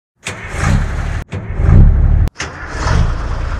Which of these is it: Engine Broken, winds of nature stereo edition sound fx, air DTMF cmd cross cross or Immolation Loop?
Engine Broken